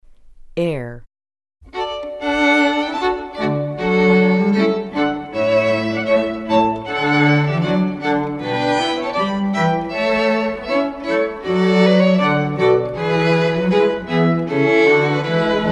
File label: air, string quartet